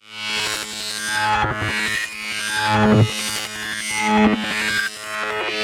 Loop 170 Bpm; Dude Stop

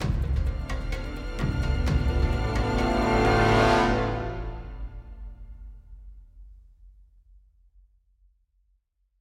Combat Lite; Mamsend

